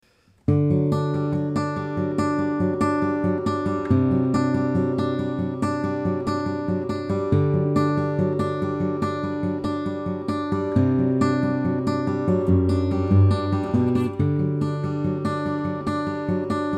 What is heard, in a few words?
Instrumental